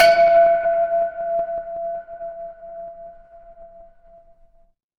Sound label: instrument singing bowl